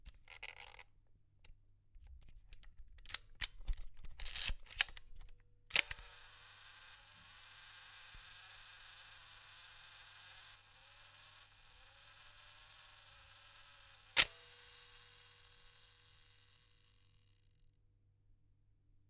Old Slr Film Camera Setting Timer And Taking Photo